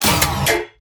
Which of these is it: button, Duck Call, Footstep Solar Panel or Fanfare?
button